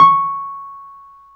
PNO, Wurly

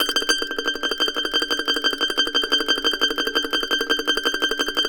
MANDO TREM G6, Whole Lotta Country; Sampleheads; Whole Lotta Country 1 & 2

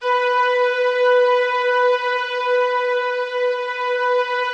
B3, STRINGS, Yamaha VP-1; Music Store; JODYSTRINGS

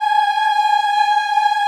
G#4 CHILD, Choir; CHILD IHP

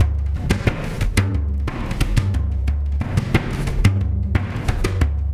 Tactile Impulse, Processed Drumkits

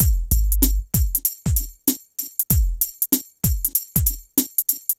PHATT BEA, Hip Hop

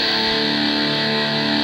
DISTORT, D - 70; Rubber Chicken Software Sample Bank

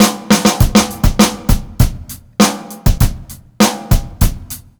beat, Roots Funk; USB Soundscan vol.41; AKAI

